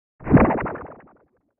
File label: Liquid Hit Wash Tremo